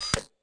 Lock Screen Touch ID Authenticated mono; i OS; iOS10

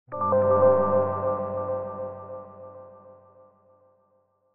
ReactOS Shutdown

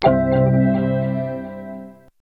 Mac Startup; Twentieth Anniversary; Mac OS

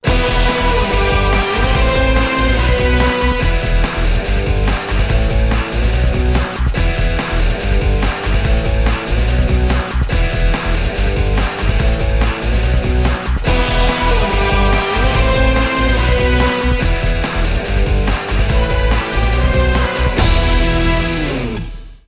Commercial In; Millionaire Soundtrack; Shuffle Soundtrack (2010 - 2017)

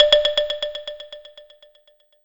echo

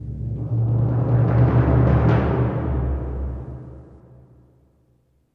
drum roll; Millionaire Soundtrack

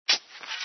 Error, Windows; Whistler

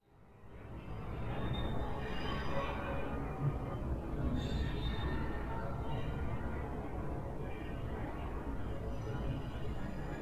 ambient Steam; Xbox

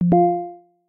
alert warning dialogue; VoiceView

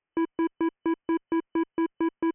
recharging; braillesense

